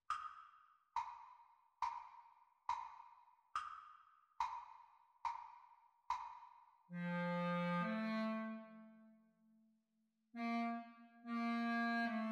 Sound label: Clarinet Duets; Grade 2; Practice Recording